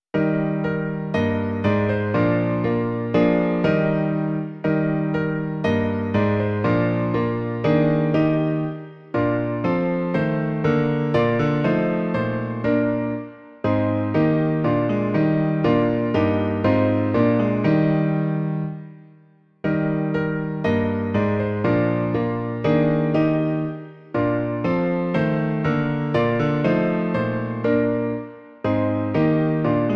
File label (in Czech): Onen hněvu den se blíží - klavír; Klavírní verze